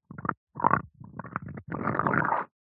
human stomach rumble gurgle bubble slow

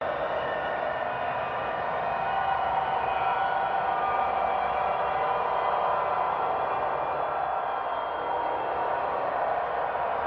texture loop wind metallic dissonant swirl vortex; Skyclad Sound

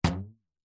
rubber band ping low pitched; foley

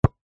sport basket ball bounce x1 on grass

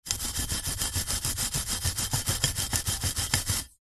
industrial wire brush on metal solid; zapsplat

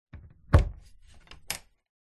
industrial storage box heavy duty latch unlock; zapsplat

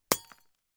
impact glass lightbulb smash

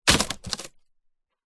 wood split crack break splinter

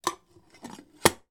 household cafetiere lid on push down empty; sound effects one

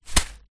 leisure juggling bean bag small catch in hand; zapsplat

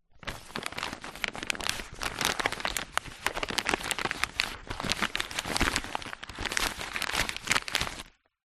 foley paper glossy movement; zapsplat

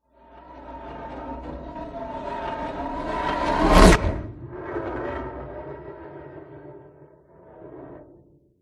Cinematic Metal Whoosh

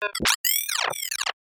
Synth Glitch; noise creations

